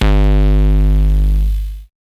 Dirty Street Bass

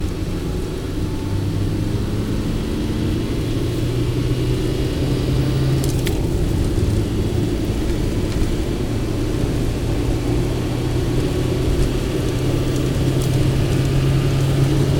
Car Accelerate

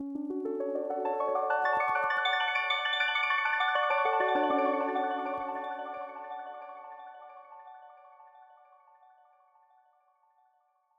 100bpm Loop Arp Fx

